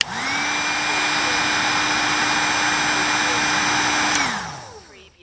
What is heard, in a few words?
Heat Gun Strong Click; key; lynne-publishing